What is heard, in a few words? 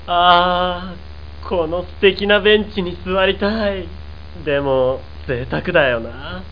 chair